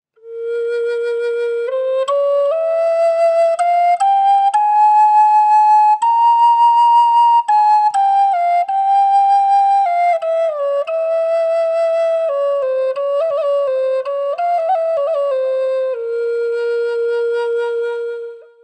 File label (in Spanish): Nativa hiyaz A, Flauta, Roble; flauta de medicina; varias tonalidades